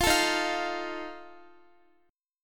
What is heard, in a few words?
D sharp Suspended 2nd Flat 5th, Guitar; D#sus2b5; strummed